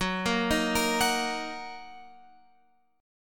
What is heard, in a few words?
Gb 6th Gb down Guitar Open F; Listen to Gb6 arpeggiated